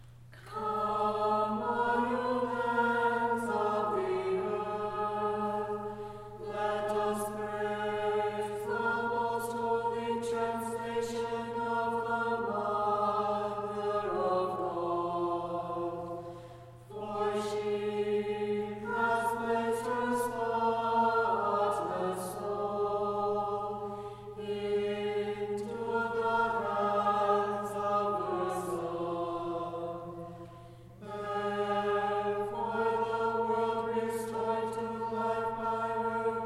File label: Kievan Sticheron Example Unison; chanting tutorial